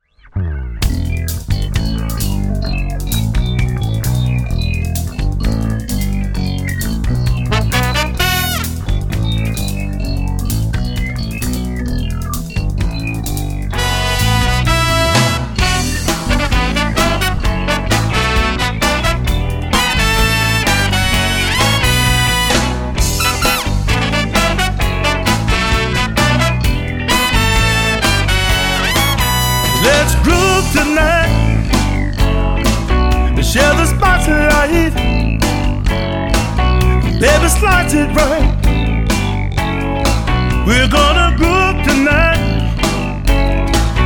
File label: groove tonight, dance band